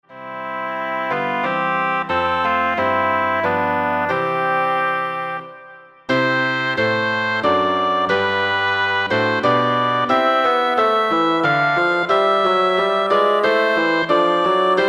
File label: Oboe Bassoon